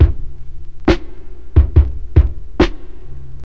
69 bpm drum beat D key, Free drum groove - kick tuned to the D note. Loudest frequency: 275Hz